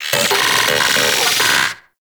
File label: Vocal Pain D; Warframe; Corpus; Arachnoid Wraith